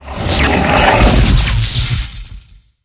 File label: felidae attack, monster